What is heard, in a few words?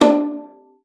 D4, VIOLA FINGER; Xsample VOL-5